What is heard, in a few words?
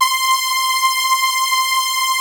POLY SYNTH; Wizoo - Powered Wave